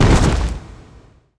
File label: DROP